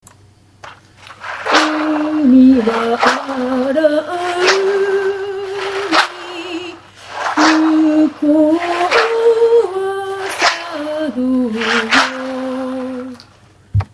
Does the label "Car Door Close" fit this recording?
No